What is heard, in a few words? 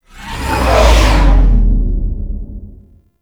Rail Jack Fly By; The New War; Moon Intro; Cinematics